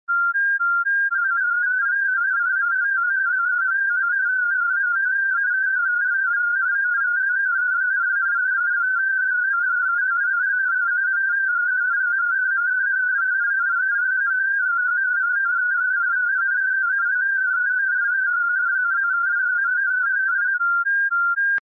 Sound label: Contestia 8-250